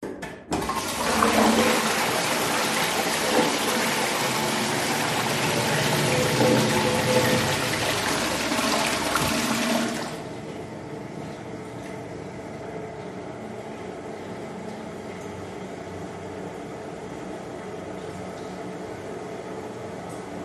household toilet flush cistern fill light room reverb